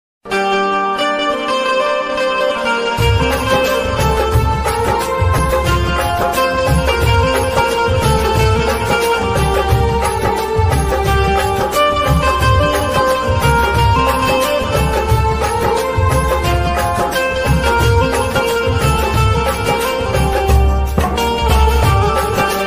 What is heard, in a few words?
instrumental ringtone bgm ringtone